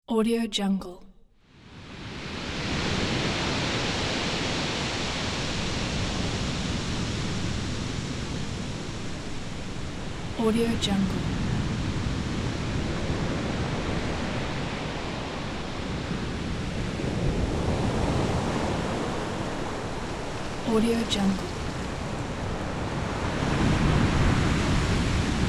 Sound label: distant storm